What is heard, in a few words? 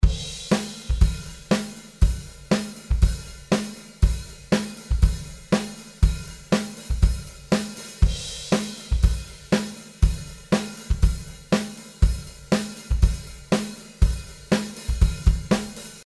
DRUMS Dry